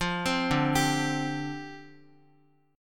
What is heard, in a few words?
D Minor 11th D, Guitar; Dm11 arpeggiated